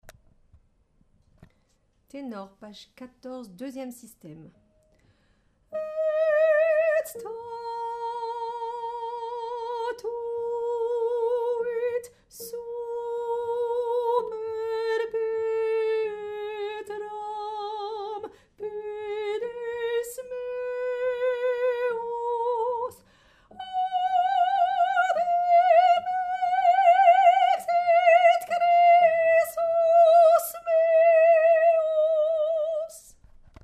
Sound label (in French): psaume Tenor